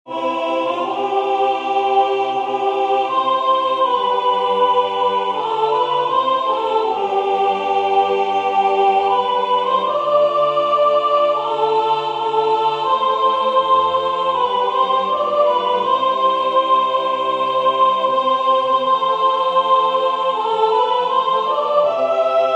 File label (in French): soprane; Benedictus qui venit